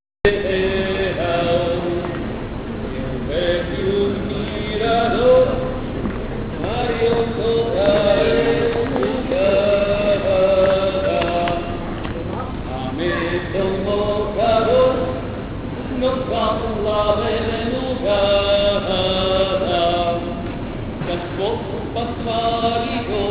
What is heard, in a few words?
Singing